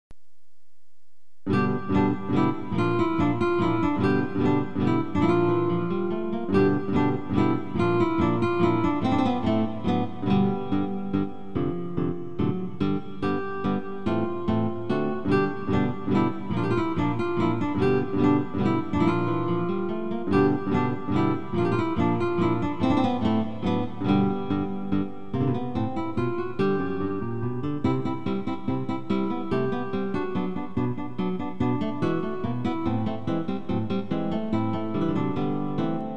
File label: spanish dance, guitar